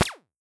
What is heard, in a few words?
standard shot short